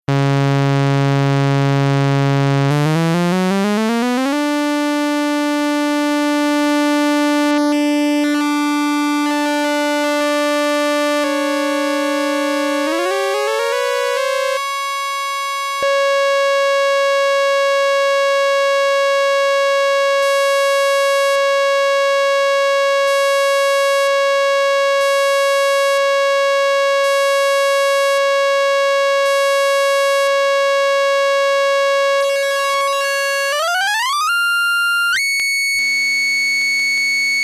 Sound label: iSEM OSC Sync